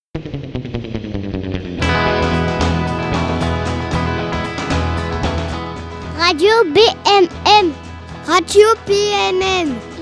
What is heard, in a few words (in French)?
generique radio; radio bmm; avec les jeunes de ronchin